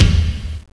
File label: CONFIRM